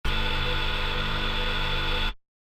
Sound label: robotic arm medium; soundscrate